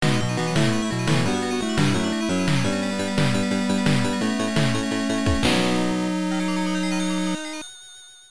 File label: Area 1 Clear; Gunhed; Blazing Lazers; TurboGrafx-16